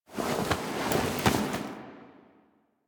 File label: Foley Ship Move Two; Drifter